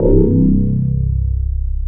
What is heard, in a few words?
DS8 C 1, Korg; Back In Time Records; Korg Universe VOL-1